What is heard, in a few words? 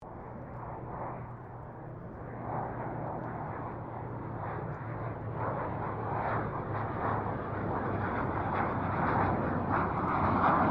AIRCRAFT GLOSTER METEOR F MK8 PASS LONG; Gloster Meteor figter jet; Airplanes; Vehicles